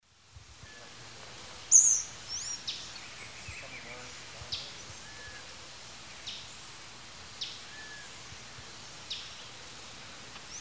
Empidonomus varius, calls or songs pair; S. America; Eastern Lowlands

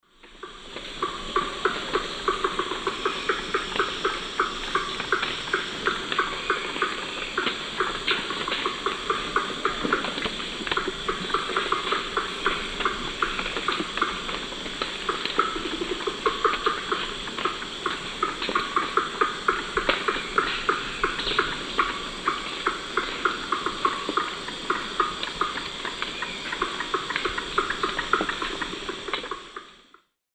Momotus momota, alarm calls; S. America; Eastern Lowlands

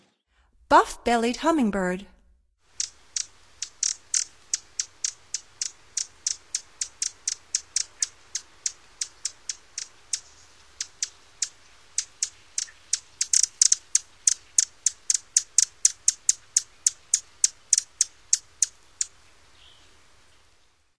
Buff-bellied Hummingbird; North America; Stokes

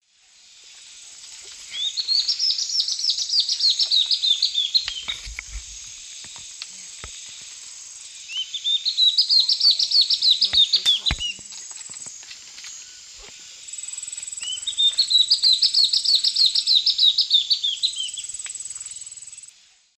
Hylophylax naevius, varzea, song; S. America; Eastern Lowlands

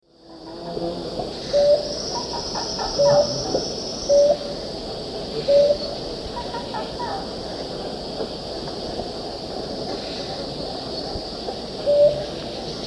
Claravis pretiosa, song; S. America; Eastern Lowlands

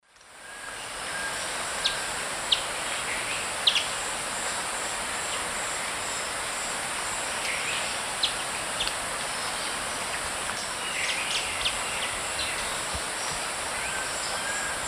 Myrmotherula menetriesii, feeding calls; S. America; Eastern Lowlands

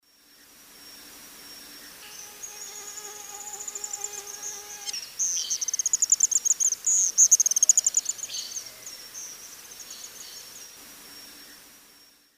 Panyptila cayennensis, calls; S. America; Eastern Lowlands

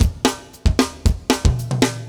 metal

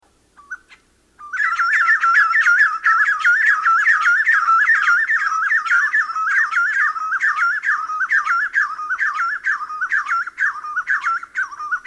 Black-Breasted Wood-Quail; S. America

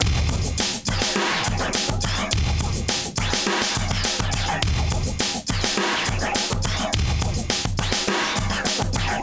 Distorted Beat, Rock Drums

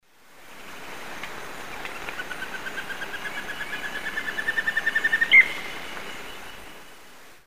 Rhytipterna simplex, song; S. America; Eastern Lowlands

